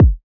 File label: Collider Down Kick